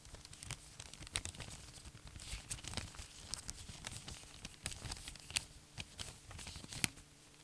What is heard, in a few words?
Paper Money